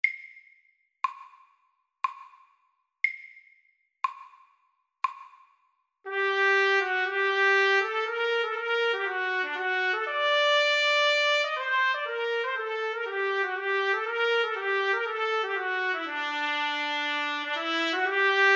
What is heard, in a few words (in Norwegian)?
Etyde i A moll SAKTE